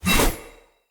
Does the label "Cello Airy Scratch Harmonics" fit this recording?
No